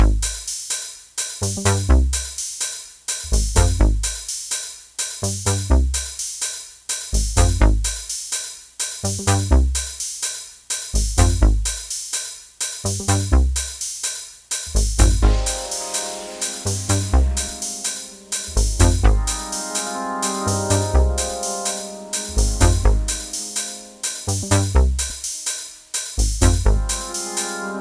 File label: Bassline Waves